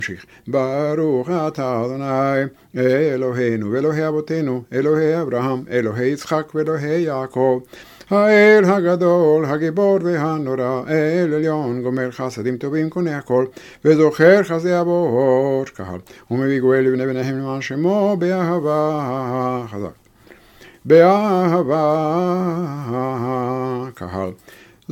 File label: Barukh magen Abraham; Kippur Musaf